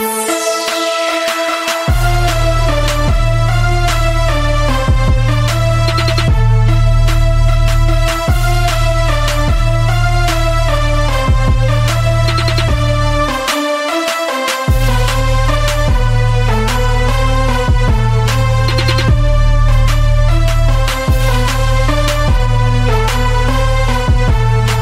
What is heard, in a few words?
Marsi Turkey Ringtone